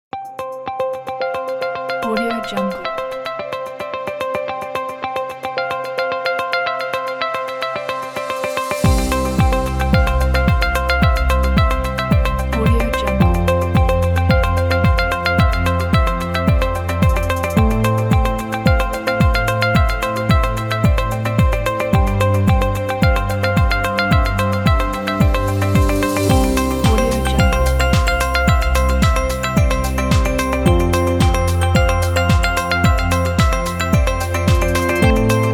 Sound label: corporate technologies